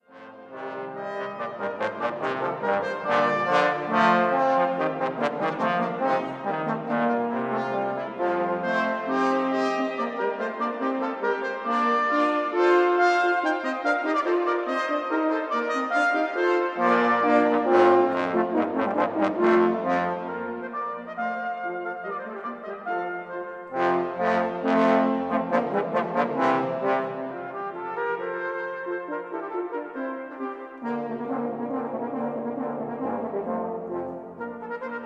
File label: brass Canzona